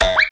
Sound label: Jump; Treasure of Nadia